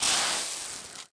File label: sand shot; World of Tanks Blitz